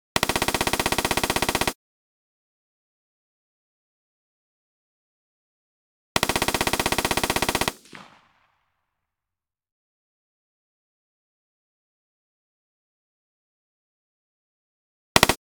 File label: M-16 Simulation, 3 Shot Burst; Hollywood Edge; Heavily Armed